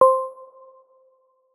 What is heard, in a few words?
menu direct click; Luxariah Default Minimal